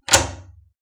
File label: Door key lock; Phasmophobia